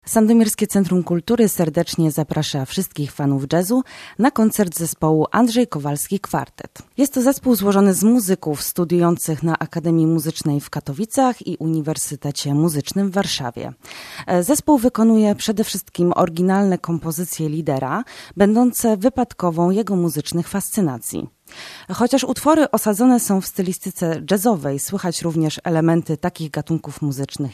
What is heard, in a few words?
JAZZ